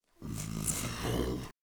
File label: idle, zombie; DayZ-Epoch; dayz sfx